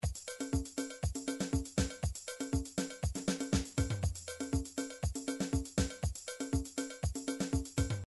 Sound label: midi drums mambo